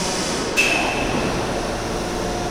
SUBWAY; AKAI S6000 CD-ROM - Volume 6; Transportation

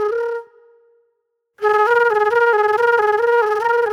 Club Flute

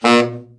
BRA TENOR SN, SAX

